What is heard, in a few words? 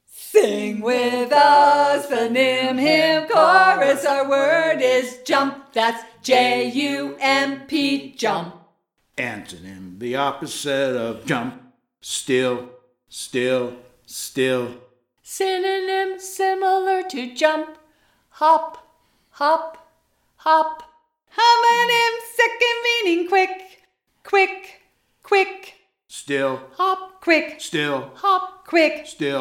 Chorus Jump